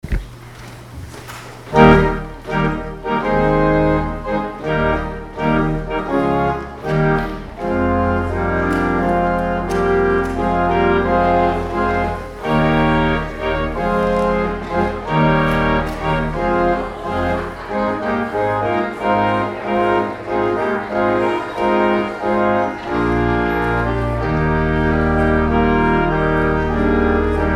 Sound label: Postlude Improv; St. Peters Hebron